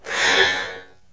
moan; The Elder Scrolls III - Morrowind

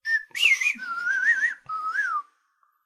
Whistler bird

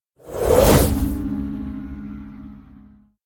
Mage General Spell Sound; SyrinScape; Clockwork entities

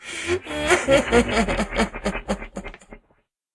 laughs; Nemira; SyrinScape; Iris hill manor

